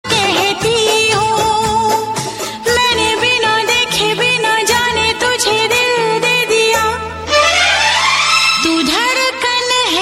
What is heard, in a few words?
hindi song